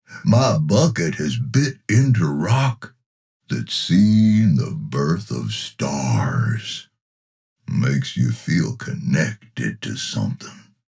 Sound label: Excavators, Construction Vehicle